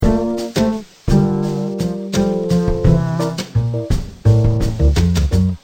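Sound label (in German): Kontrabass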